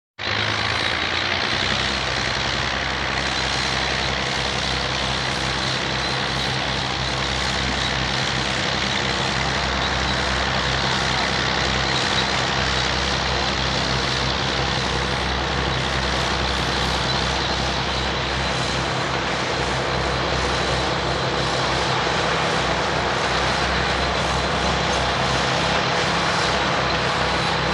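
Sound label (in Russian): Пашут землю борона трактор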